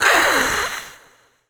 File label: ghost witch voice hiss